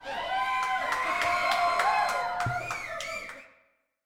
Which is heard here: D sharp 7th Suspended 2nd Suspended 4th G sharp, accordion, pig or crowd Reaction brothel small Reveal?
crowd Reaction brothel small Reveal